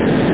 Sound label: MISSILE